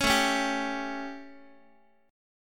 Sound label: A 7th Guitar, chord; strummed; JGuitar